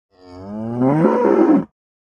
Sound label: Cattle, Cow Bellow. Single Short Angry Bellow. Close Perspective.